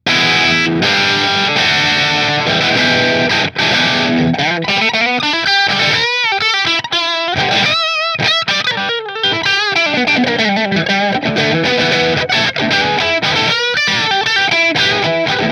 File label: Nola Model 8 Bridge Marshall, guitars; New Orleans Guitars Model-8 Natural Finish